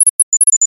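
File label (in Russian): легкий писк старого тамагочи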